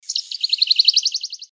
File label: Grey breasted Prinia